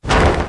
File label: break; Monster girl quest Part1,2,3; Visual Novels